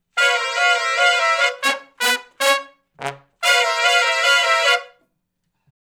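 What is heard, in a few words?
R B Riff (C#) harmony to unison, horn; Sections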